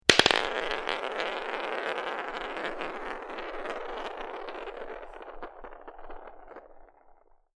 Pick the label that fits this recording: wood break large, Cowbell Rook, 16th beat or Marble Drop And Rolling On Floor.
Marble Drop And Rolling On Floor